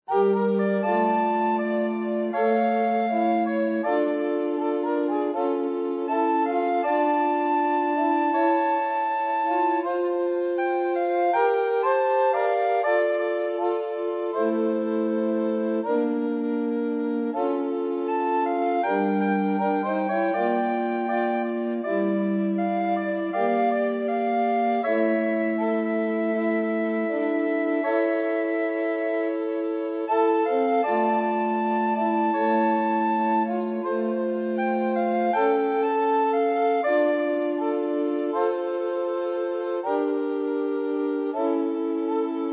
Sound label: AATB Recorder Quartet